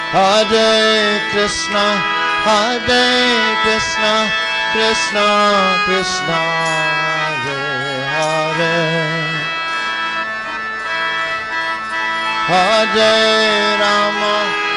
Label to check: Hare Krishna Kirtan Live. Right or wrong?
Right